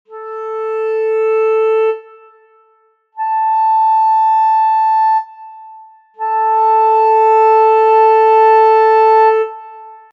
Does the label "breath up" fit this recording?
No